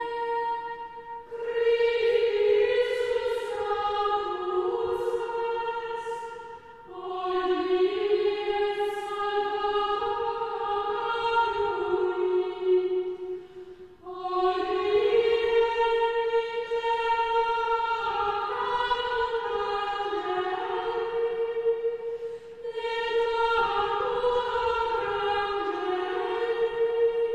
Chant Antiphon; Traditional; Chester Processional

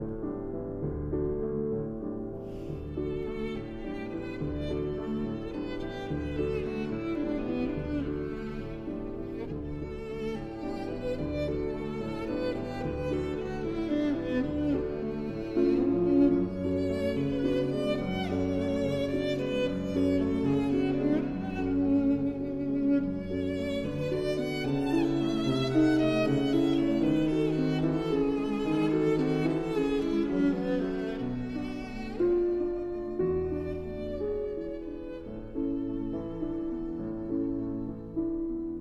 Violin and Piano in D

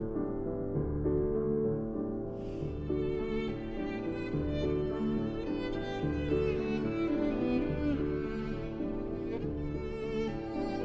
Violin and Piano in D

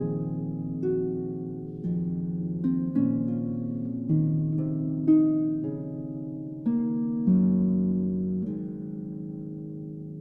Harp Concerto Lullaby

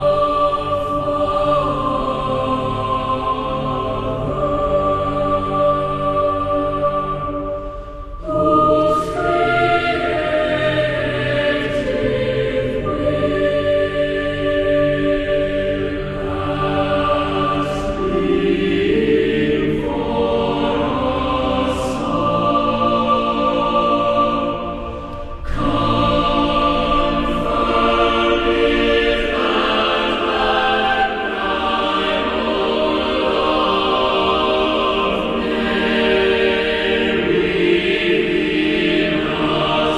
Boychoir - Chorale after an old French Carol

